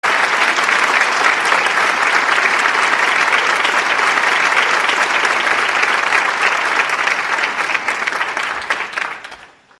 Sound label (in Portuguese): aplausos